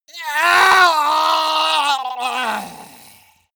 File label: male die gurgling; soundpool